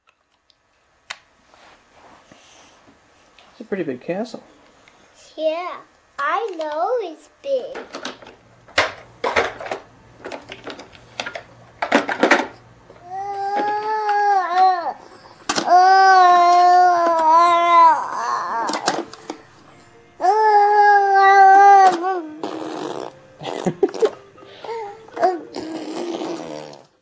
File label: play toys, The kids play in the their play room